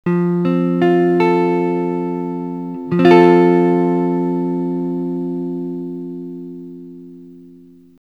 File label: f major, guitar